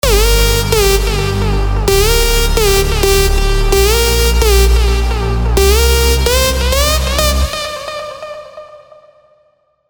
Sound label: Melodies Gm (130 BPM); MIDI ANTHEMS